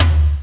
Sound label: LOW FL. TOM; Dark Forces Demo; Dark Forces Instruments